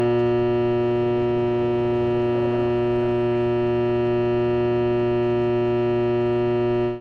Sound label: Mechanical hum